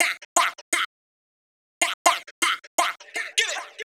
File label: 124 Vox, Drum Loops; 124bpm; Nu Dance Electro